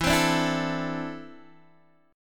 E 7th Suspended 4th Sharp 5th, Guitar, Open D

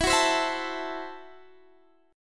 Gb Minor 7th Flat 5th, Guitar

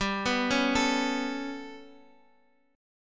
B 7th Suspended 2nd Sharp 5th, chord

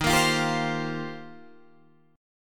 Eb 6th Add 9th, Guitar, Open D

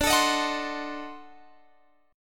D 7th Flat 9th D, chord

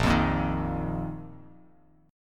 Bb Suspended 4th, Bb, down; Bbsus4 strummed

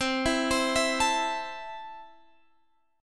C 6th C down, chord; arpeggiated

